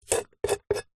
food knife scrape vegetable choppings from wooden chopping board